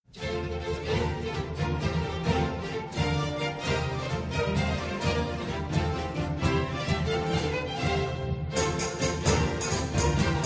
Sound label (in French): Air des Archets; Charpentier; Malade Imaginaire